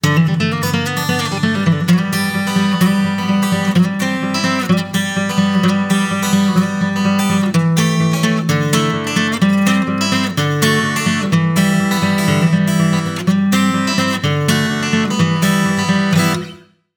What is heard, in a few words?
acoustic guitar